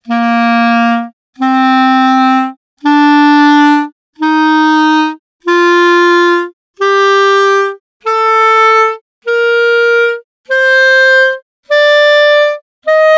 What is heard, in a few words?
doremi